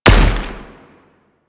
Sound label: Anti Aircraft Gun Shot A; Weapons; Warframe; Archwing